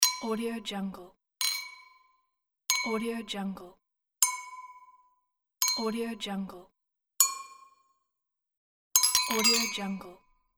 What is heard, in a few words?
wine glasses